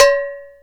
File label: Cowbell; 3DO; Toolkit 1.5; GMPercussion44k